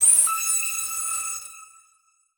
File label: Transition Whoosh Laser